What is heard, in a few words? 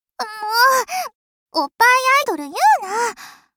applause; osu